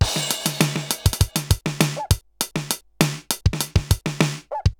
RX17 (100BPM), cyborg beats; musicradar

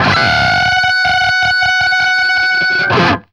DBLE F#4 CUT, guitar; East West; Steve Stevens Guitar Samples Collection

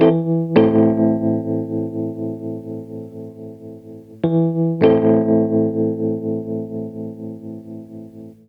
RHOD; Roots Disco; USB Soundscan vol.32; AKAI

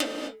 PERC GATED; Crypton; DrumBase5000

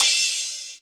HH, Dance Mega Drums; Best Service; HH-HIP

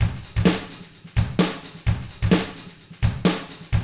HARD ROCK, Drums

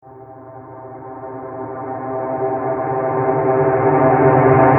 Backwards String OS; Sonic Foundry; Rondo Brothers Trip-Hop Loops & Samples; El Corazon

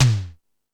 Lazer Drums, E-MU Pro-Cussion; drum machine

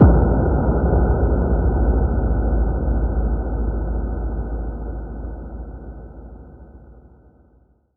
FX Kicks

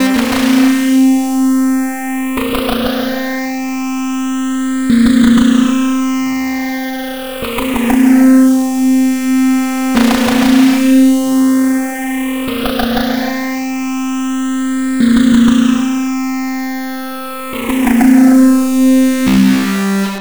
Ambient Melody; Drums & Drones

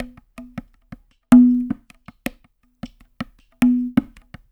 EPH DHOLE, ETHNIC INST; Spectrasonics; Liquid Grooves 1 & 2

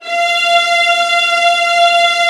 violins G, Synclavier Strings; ILIO

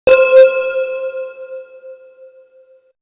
Synth; AMG

